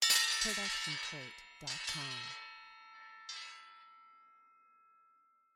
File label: Metal; SoundsCrate